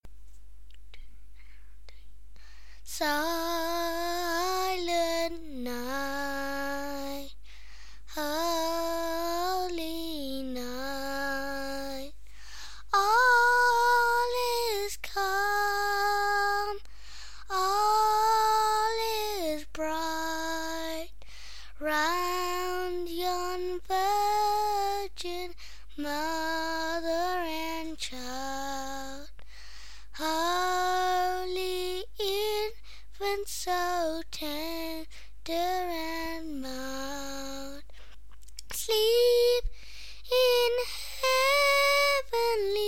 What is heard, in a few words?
Silent Night Sung